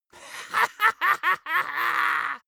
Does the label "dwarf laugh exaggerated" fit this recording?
Yes